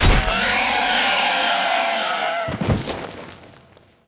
hydra die; Zeus

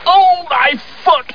foot; monty pythons

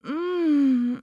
groan

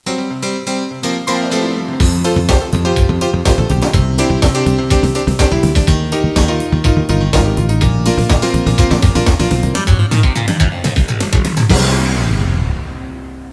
fun piano

